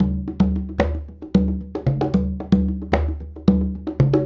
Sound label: Congas; Essential Percussion